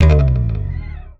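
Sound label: slap, bass; Xsample VOL-1; SLAP+THUMB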